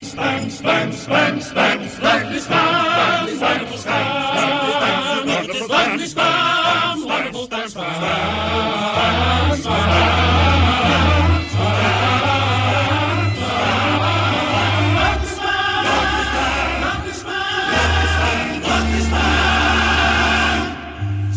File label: song